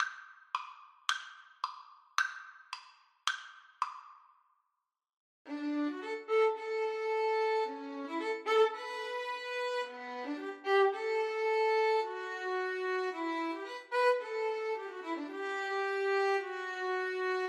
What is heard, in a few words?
violin duet